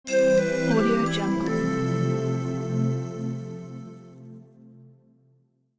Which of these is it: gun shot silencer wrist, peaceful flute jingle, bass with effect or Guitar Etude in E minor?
peaceful flute jingle